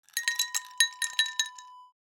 ice cube drop

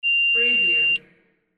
fax beep

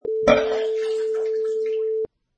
Vomiting

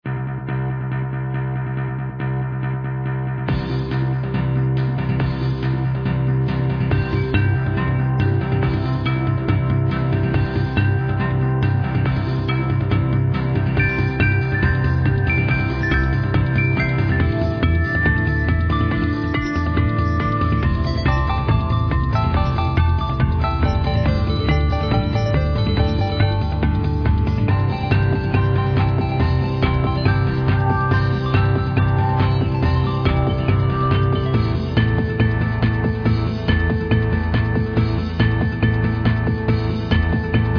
Pop Dance Bells

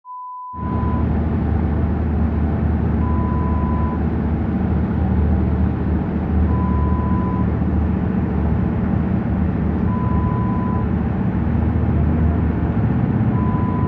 Yacht sound

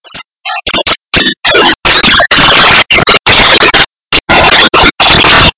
Dusk rainforest Ketambe

